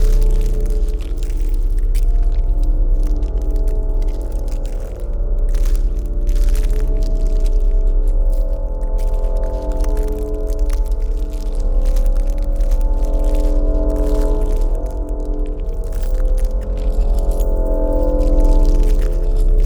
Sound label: pro ship science station idle; biogenesis